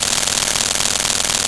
spin

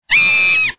eek

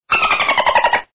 dolphin

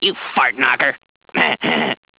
fart

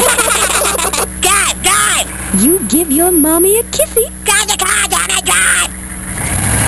drive

